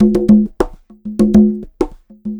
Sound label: Conga Loop (100BPM); musicradar